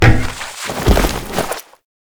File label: APC Dismount Foley; Warframe; Lasrian